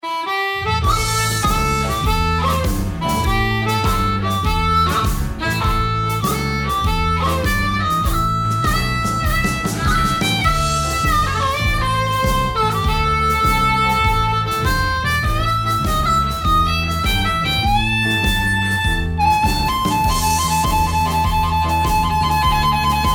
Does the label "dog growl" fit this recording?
No